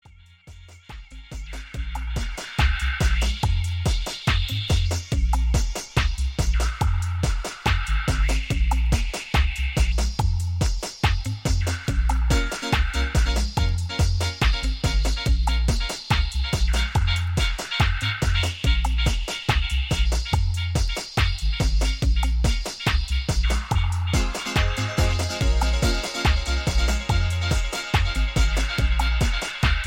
Drum & Bass

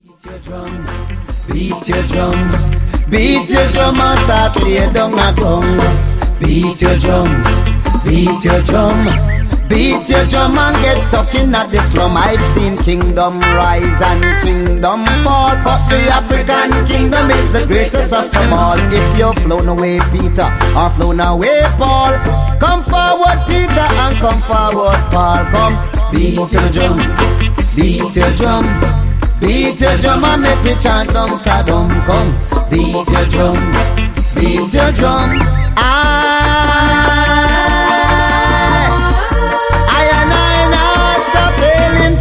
Beat U Drums

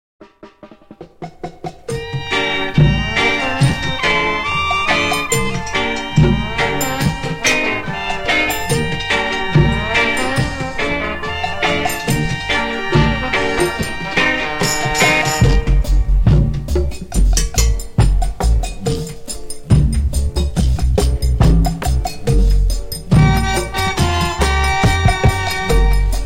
Distant Drums

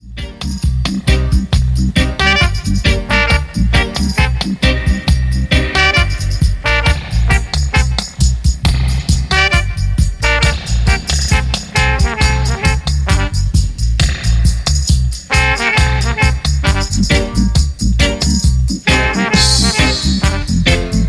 Bionic Horn Dub

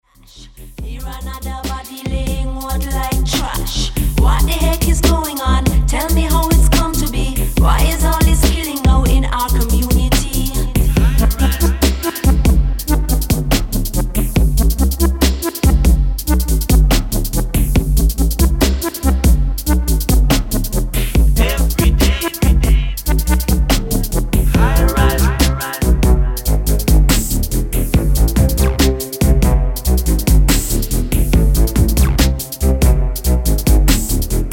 Dubstep

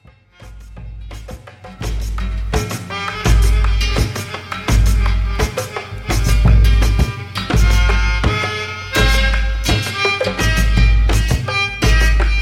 Drums

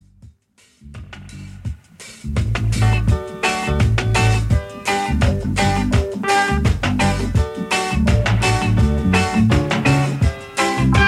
Instrumental

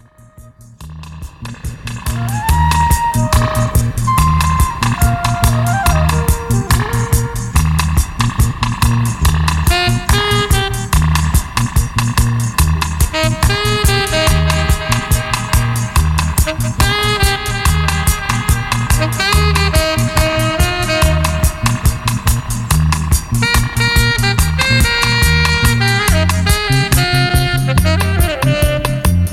Wicked Flute Dub

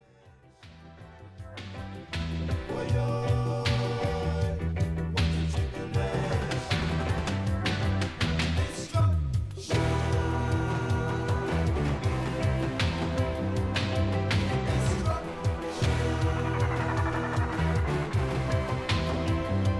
Dub

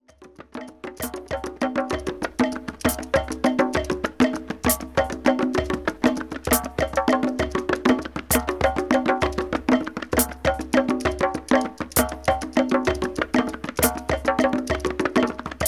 Mambo Drums

